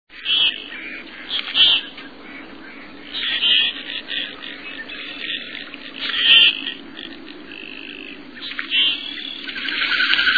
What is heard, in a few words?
Common Goldeneye, songs